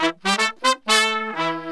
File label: BRASS SALSA; Best Service ProSamples vol.14; AKAI